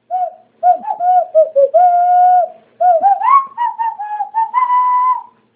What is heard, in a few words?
Handwhistling